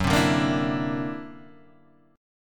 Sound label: D Augmented 9th F sharp, guitar